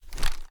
kilo98 reload empty end; gmod; viper

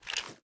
plr sm beta reload fast start; gmod

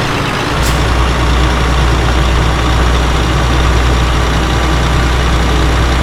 fourth cruise, vehicles; gmod; tdmcars; scania

